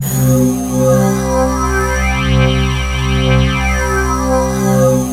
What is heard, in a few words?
PROPHET VS, OLD SYNTHS; Rubber Chicken Software Sample Bank; SCI PROPHET